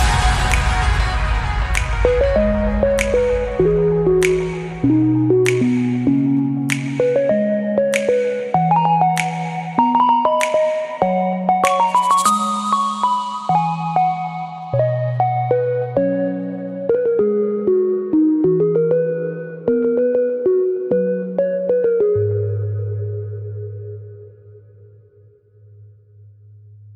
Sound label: top english ringtone